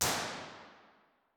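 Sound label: impulse response, nuclear reactor hall; b-format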